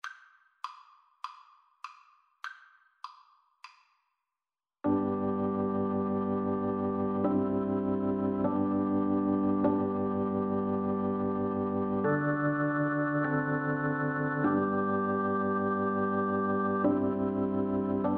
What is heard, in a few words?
violin